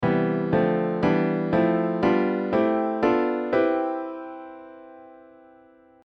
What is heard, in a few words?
Start on C Ionian; Modal Harmony; Modal Harmony: An In-Depth How-To Guide